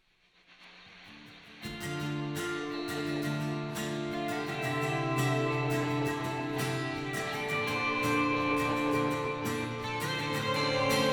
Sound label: untitled instrumental